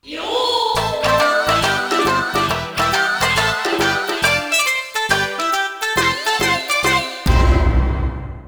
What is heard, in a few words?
Unused Fanfare; Ganbare Goemon Uchuu Kaizoku Akogingu